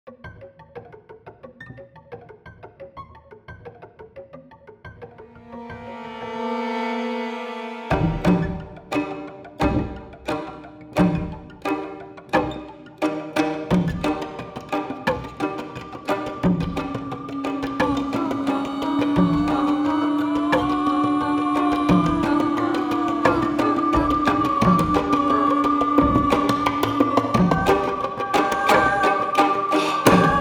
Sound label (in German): Brennende Bienen 89bpm Stereo Mix